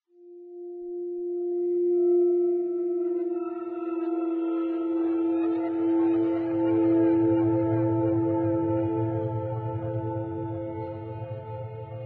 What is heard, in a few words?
space, Ambience